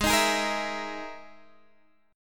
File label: E-Major Sharp 11th G sharp; EM#11/G# strummed